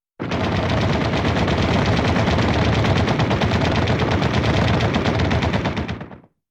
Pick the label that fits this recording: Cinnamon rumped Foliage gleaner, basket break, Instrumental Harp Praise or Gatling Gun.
Gatling Gun